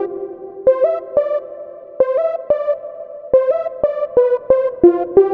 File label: Saw Lead